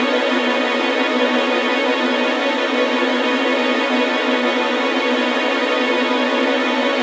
Orchestra Violins Trill Loop; gamerip; shu; ps vita ps4 switch windows

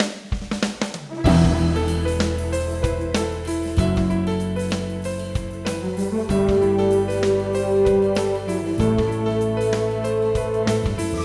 Success; Sandy Junior Aventura Virtual

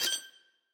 Book Puzzle Piece Match

